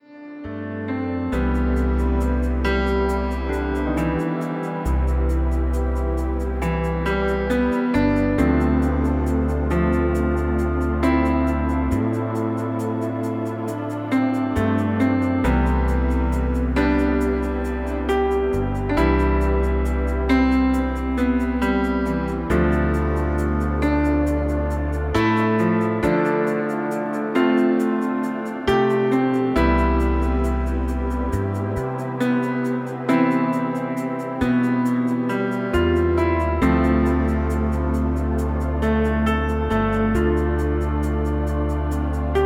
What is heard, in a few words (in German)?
Wellness Pur Melodien zum Träumen Entspannungsmusik mit Klavier